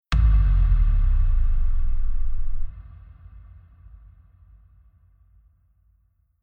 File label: Future Bass FX Kick Bass